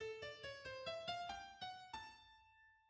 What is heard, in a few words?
String Quartet, first tonal group theme; Bartok; String Quartet No. 6